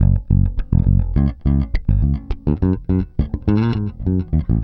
Pop, Bass

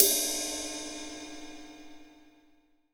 18 OLD, 18INCH OLD ZIL RIDE

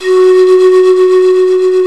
SIKU VIB F#2; The Andes

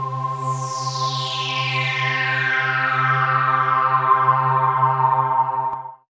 SWEEP C; SYNTH; AMG; Global Trance Mission VOL-2

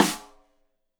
Rim Shot Stereo; Deeply Sampled Drums; SMDrum

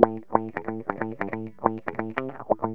GTR, Funky; Zero-G; Funky Elements

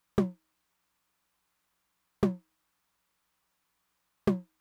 high tom, Roland TR-909

